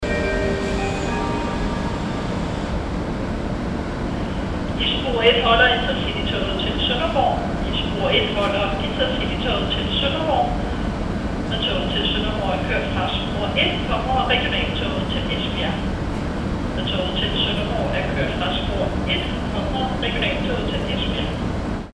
koldingstation, toglyde

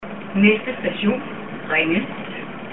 ringe

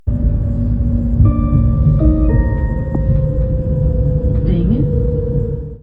vinge; Vinge Station er en S-togsstation på Frederikssundbanen, der ligger i byudviklingsområdet Vinge i Frederikssund Kommune.

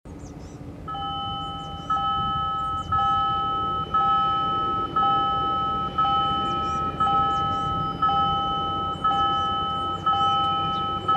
overkoersel

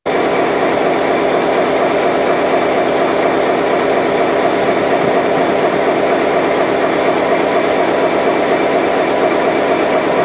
mz tomgang

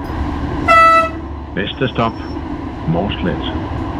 Mårslet (med tyfon); Letbane: Mårslet (og tyfon); Tyfon + Jørgen Leth udkald. Næste stop.; Aarhus Letbane

